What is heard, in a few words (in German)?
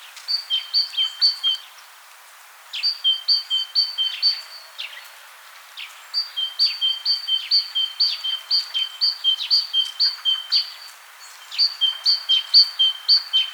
kohlmeise gesang; vogelstimmen; nabu; lars lachmann